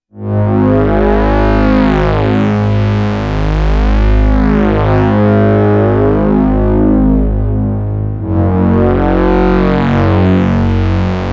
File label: Evil Sweep; korg bent